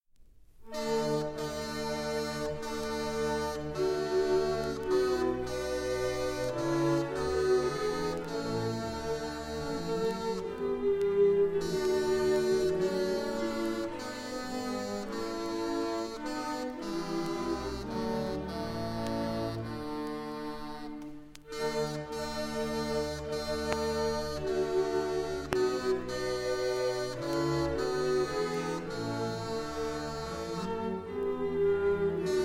Ein feste Burg; capella academica halensis

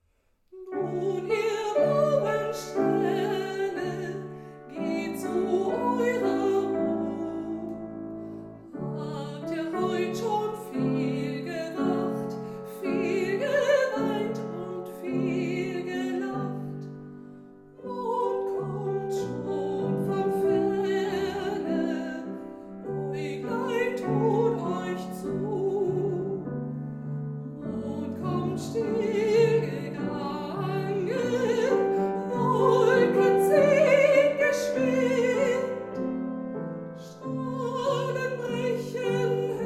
wiegenlied

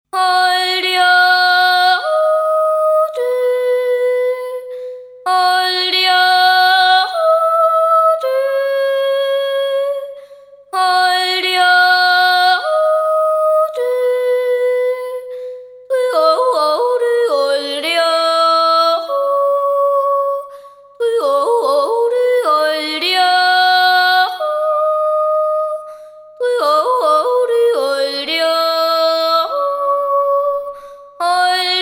Naturjodler; Benneckensteiner

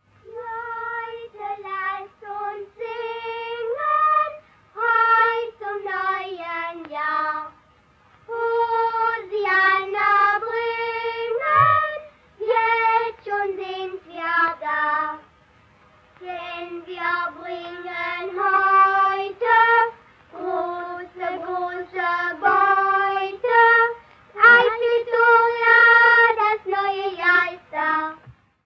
Heut lasst uns singen

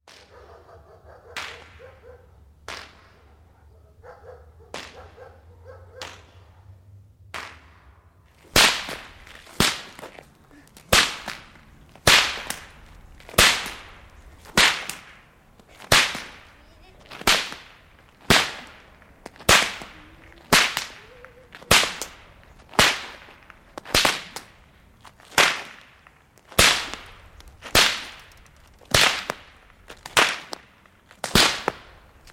Knallen

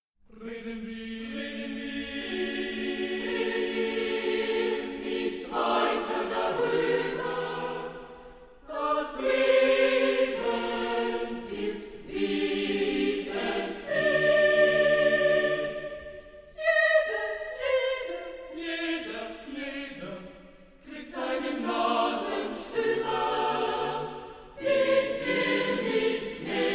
Chor

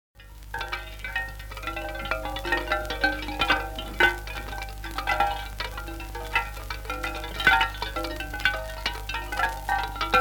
Köhlergeläut

